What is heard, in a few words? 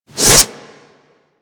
Boomerang Fold; Warframe; Weapons